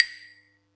Yamaha EX-5

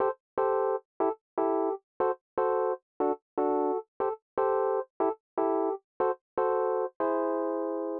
120 Rhodes A, Keys, Nu Jazz House; PowerFX

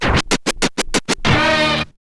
scratch short, Vinyl scratches

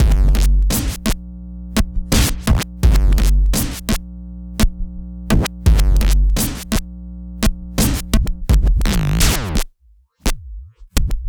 Structured Rhythm, IDM Rhythms

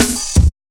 Steppa Cut, Drum Loops 169.5; Zero-G Jungle Warfare 1, 2 & 3

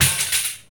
TAMB; Roland - LA Composer vol. 1

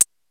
R8 DANCE KIT; Rubber Chicken Software Sample Bank; DRUMS + PERC

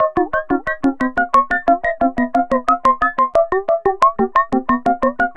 Serge, Synths Arpeggios; Electronic Point Blank

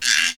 Guiro Wood; Club-50 - Foundations Roland

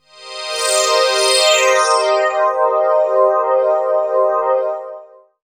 Open Sweep, Pads; Zero-G Jungle Warfare 1, 2 & 3; 90 s Sample CDs

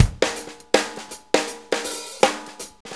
Shuffle Fill, Drum Tools

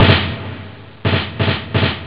drum; cadaver; Amiga Soundtracker Sample Packs

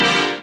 SYN House Chor; Best Service Gigapack 1

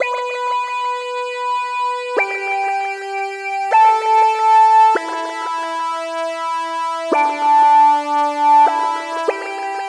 Keyboard

